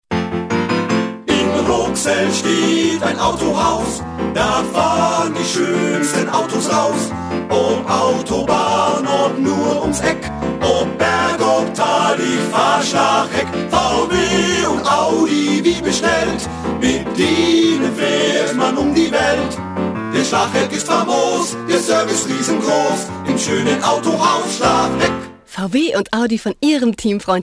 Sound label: Song